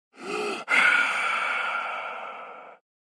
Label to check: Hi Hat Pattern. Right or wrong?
Wrong